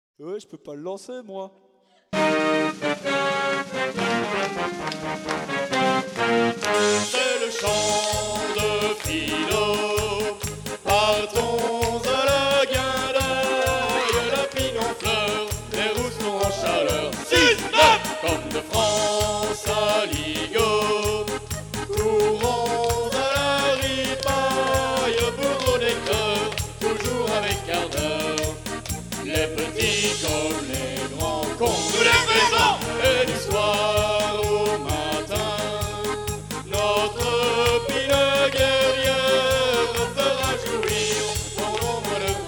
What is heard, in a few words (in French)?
Chant De Philo; folklore